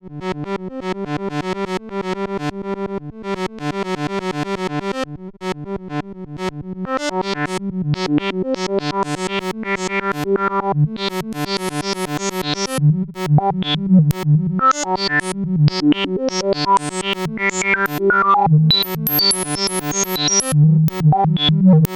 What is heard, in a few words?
Linnaeus Filter Q Q Comp; Rossum Electro Music